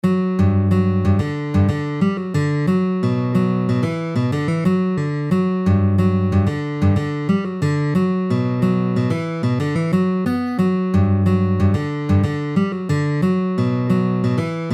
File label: riff; vstuplenija i pripeva